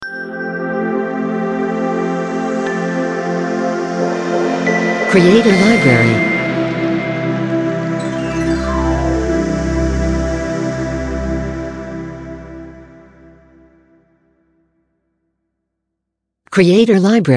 Good Morning Nature Logo; Logo-Idents

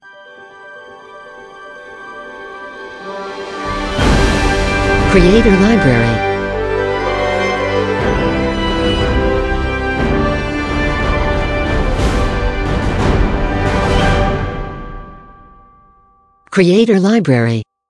Hollywood Opener; Logo-Idents

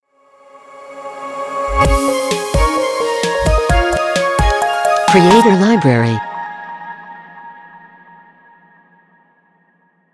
Delight Ident

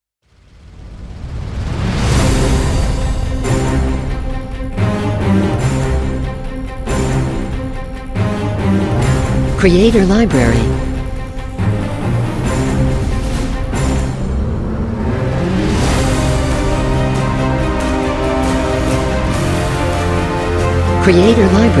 Blockbuster Trailer

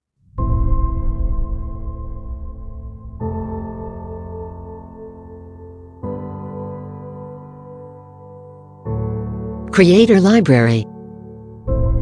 Epic Fantasy, cinematic music

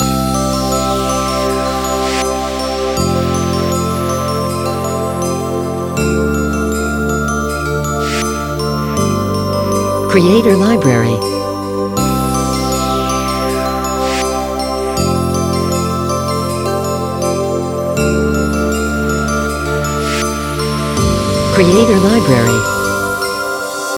Christmas Loop

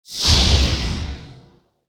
Noise Down

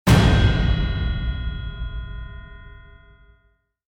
Trailer Hit

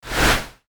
Short Swoosh

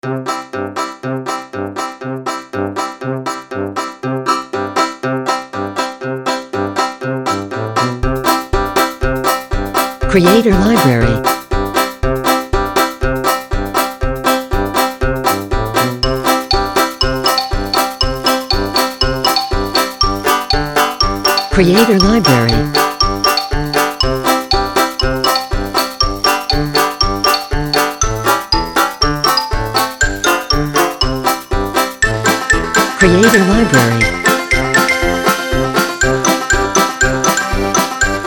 Comedy Action Country, cinematic music

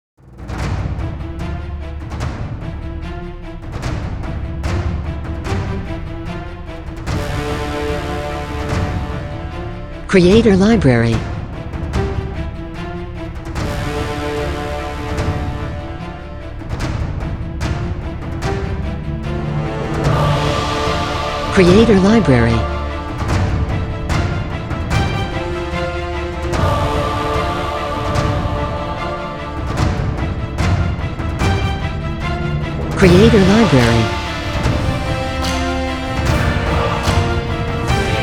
Epic Cinematic Style Intro

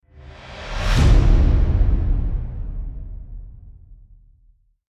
Swish

